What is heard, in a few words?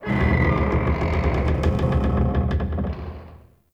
Cave Mine Wood Beam Creak; Ambience; Midrath Island